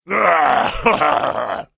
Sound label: ogre